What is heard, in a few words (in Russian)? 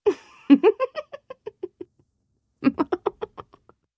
zvuki zhenskogo smekha